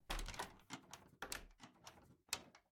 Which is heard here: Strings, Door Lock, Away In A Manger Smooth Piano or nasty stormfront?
Door Lock